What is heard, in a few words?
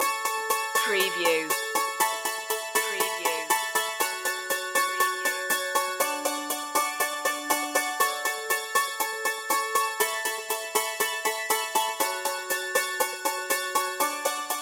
sunshine loop